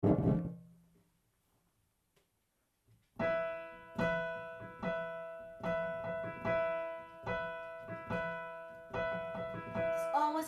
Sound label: Refr ALT